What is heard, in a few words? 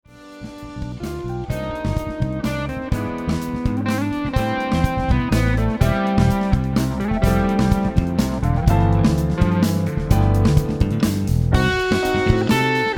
Waltz, guitariste